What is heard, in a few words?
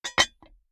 Plate